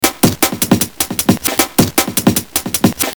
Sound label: jungle